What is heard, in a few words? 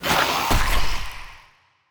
enemy spawn; bloom minigame